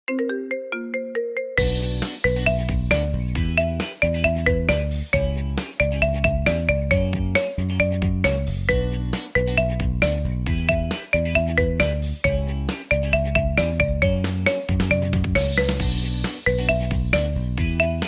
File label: Marimba Remix